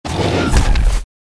Tiger

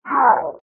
gnoll high punch

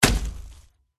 archer attack contact up

